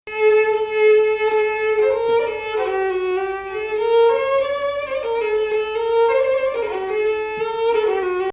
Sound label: Hijaz Octave; Zanjaran